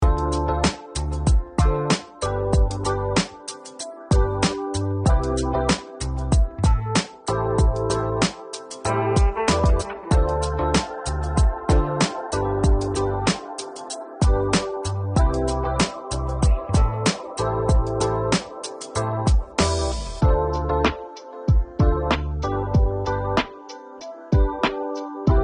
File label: HIPHOP CHILL LOFI; Adobe Stock